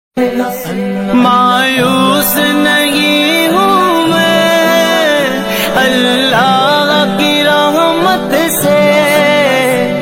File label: Islamic Ringtone